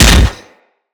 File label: Impact Metal; Weapons; Furax